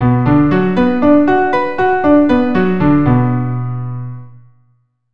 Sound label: Bm arp 100, keyboard